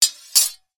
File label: warfare sword place down on surface